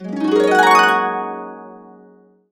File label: GB, Harps; USB Soundscan vol.62; AKAI